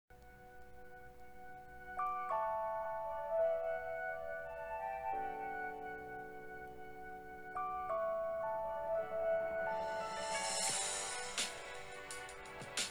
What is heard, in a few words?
soul music